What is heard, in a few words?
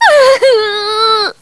cry